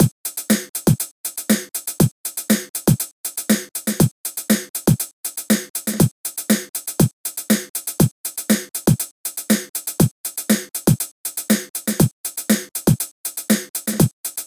@ 120bpm; Mario Kart 7; Frontrunning; WALUIGI PINBALL

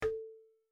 UI Button; my cute commissar 2020 gamerip

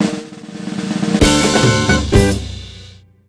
Jingle Game End; tamagotchi connection corner shop 2 ds

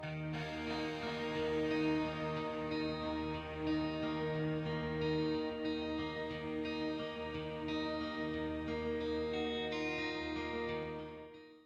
Intro Stinger; Great Wall

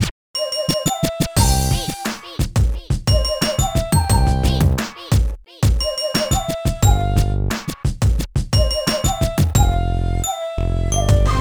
Music CASUAL BPM88; Super Scribblenauts 2010 NDS

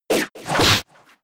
Sucker Punch; Pokemon; Gen 5; Blk Wht Blk2 Wht2

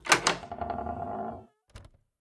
Door squeak creak fast; Devour

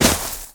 land snow

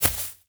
warrior walk grass

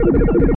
Computer Sci Fi Processing Loop Mono

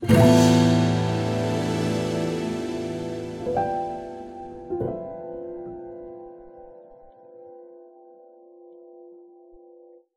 Sand Surfing Chord

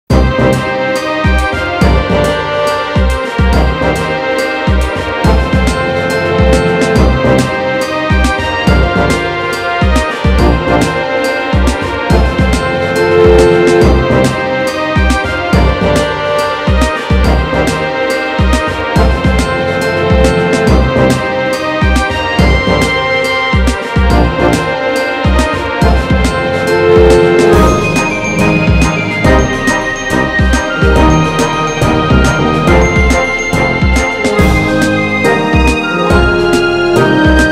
Mountain Pass; Shrek Smash n' Crash Racing OST

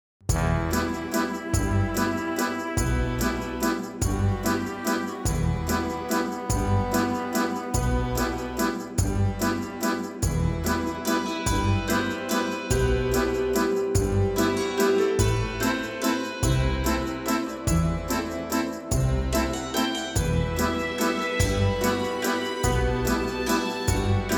Waltz; Bohemian Killing

